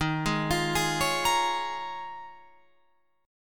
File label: Eb 7th Suspended 2nd Suspended 4th Eb, Guitar Open F; arpeggiated; 15,15,17,15,16,17